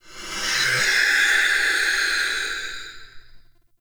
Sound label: Binaural Whispers; Warframe; Shadowgrapher